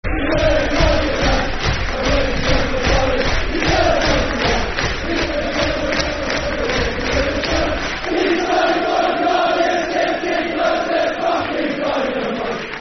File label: Football Chants (Soccer Songs), Cardiff City